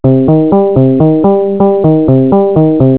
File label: tune